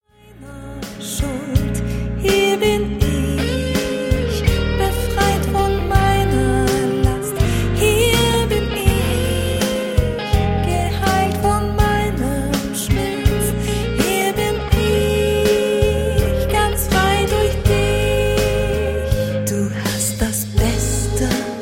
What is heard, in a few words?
Gospel Choir; Gospel Celebration Choir